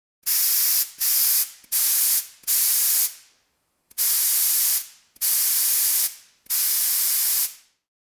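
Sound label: paint spray can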